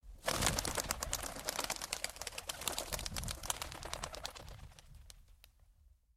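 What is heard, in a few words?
howler monkey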